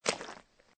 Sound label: footstep mud walk; Casanova